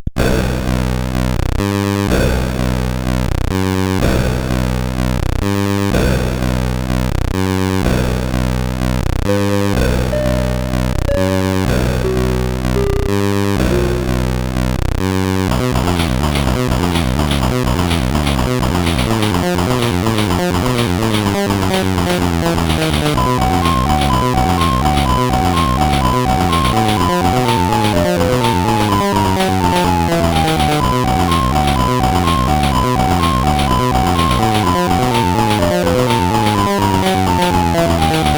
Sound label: sid CSG8580R5